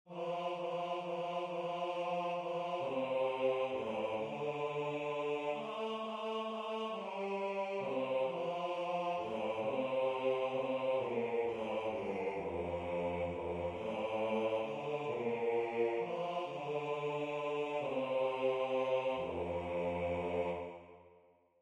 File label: Bass